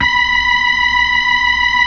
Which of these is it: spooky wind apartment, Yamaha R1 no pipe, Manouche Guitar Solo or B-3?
B-3